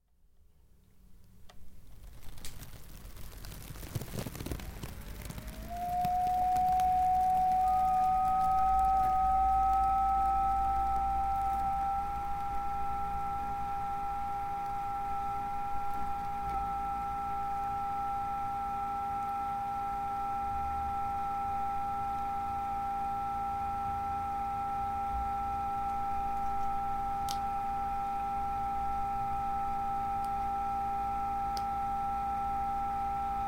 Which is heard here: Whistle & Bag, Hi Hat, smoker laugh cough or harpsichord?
Whistle & Bag